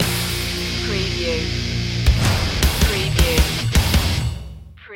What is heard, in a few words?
firestorm stinger